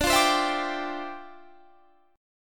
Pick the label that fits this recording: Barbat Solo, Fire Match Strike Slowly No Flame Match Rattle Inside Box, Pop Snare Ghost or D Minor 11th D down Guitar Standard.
D Minor 11th D down Guitar Standard